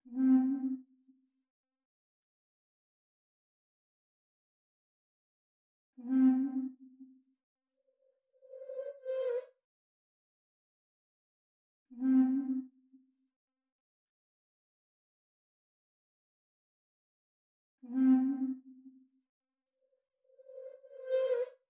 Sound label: 81 BPM A# Min Wail; Cymatics; Orchid; Destiny Gold Expansion